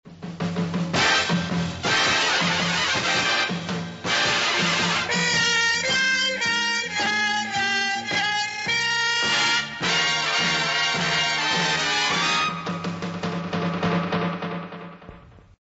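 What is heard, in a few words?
instrumental; r1 is wderful; 60s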